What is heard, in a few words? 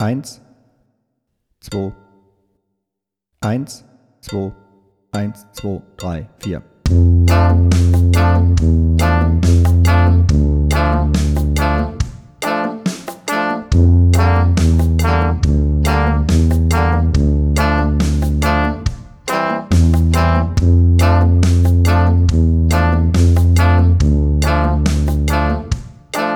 Tuba Solo